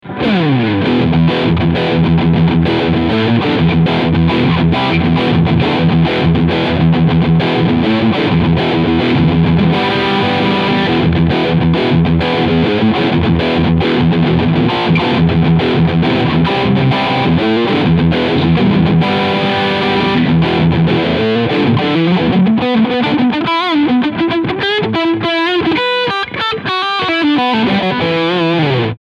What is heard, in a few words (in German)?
Hagstrom Super Swede Limited Overdrive Steg Humbucker